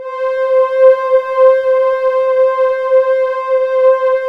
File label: JV-1080 PEACEFUL; VINTAGE-EXP